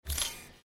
remove marker

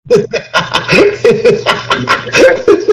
laugh

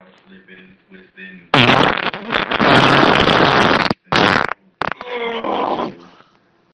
humongous fart

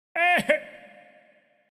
ahhee

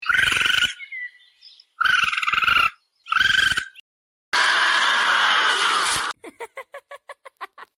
cute jumpscare

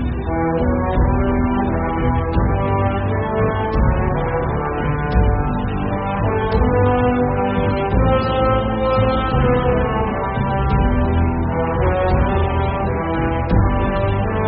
alert

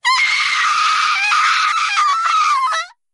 scream; nier

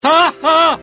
haha

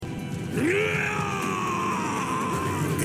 neeauuuughhh; ganondorf

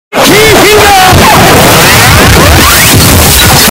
earrape

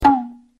bamboo hit sound effect

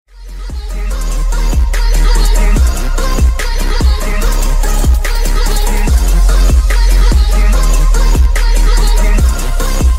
follower sub donate sound effect alert; twitch

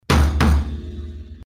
slam

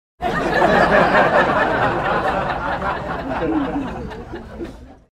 audience laughing

